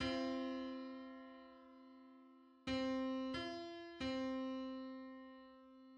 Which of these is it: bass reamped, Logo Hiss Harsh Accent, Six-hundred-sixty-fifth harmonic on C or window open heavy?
Six-hundred-sixty-fifth harmonic on C